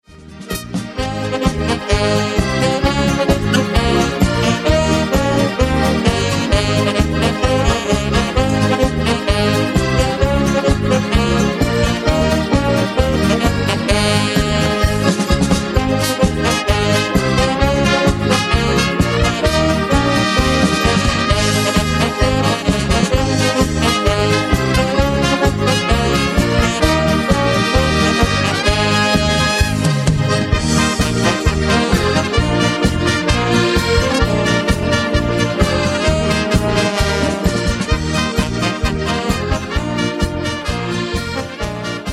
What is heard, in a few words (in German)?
Hohner, Hochzeitsmarsch